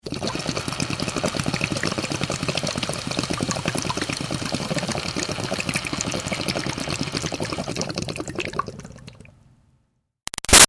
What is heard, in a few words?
Bong Water Pin In Inhale Bubbles Long Muted